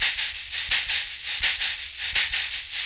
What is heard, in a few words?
Berimbau Shaker; World Percussion